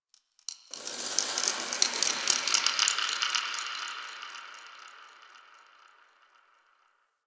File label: Space Rings Details; Ambience; Exterior; Warframe; Railjack